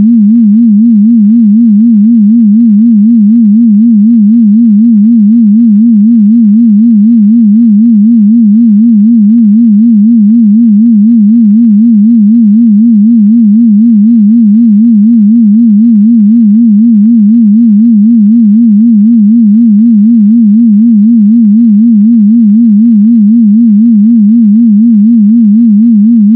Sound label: vibrato 4hz